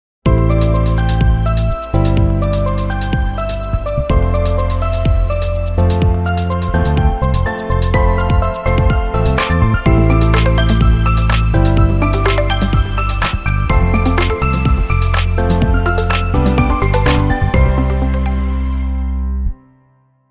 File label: oppo ringtone